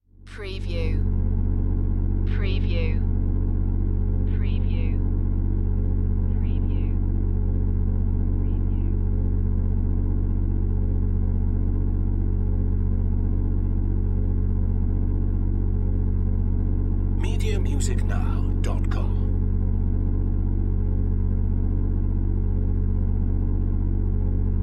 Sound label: Electrical Hum Drone; Sound Effects Bible